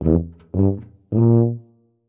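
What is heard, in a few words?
sounds of tuba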